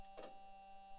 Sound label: mic, mars2020 edlcam